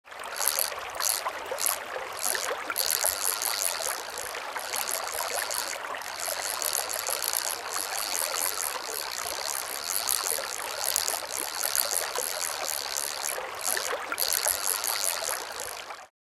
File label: Fly fishing fishing rod reel boat waves ambience